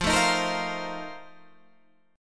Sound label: F 11th F, down, Guitar Drop D